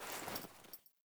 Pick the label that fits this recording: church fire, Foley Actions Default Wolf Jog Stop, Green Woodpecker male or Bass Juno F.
Foley Actions Default Wolf Jog Stop